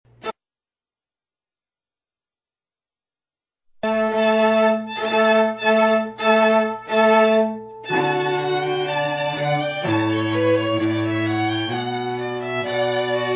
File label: trio